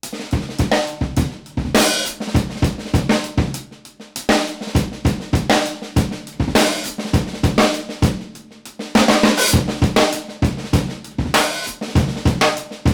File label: beat 100, acoustic